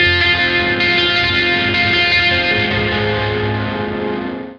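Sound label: Lake Hi Gtr F minor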